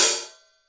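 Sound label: HI-HAT CENTER; Greytsounds; HI-HATS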